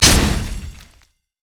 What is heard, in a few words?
javelin toss oh; nidalee